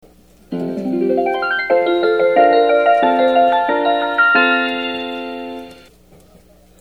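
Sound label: JR West chime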